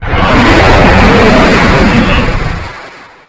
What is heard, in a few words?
breath gas, dragon; Fate The Traitor Soul; MONSTERS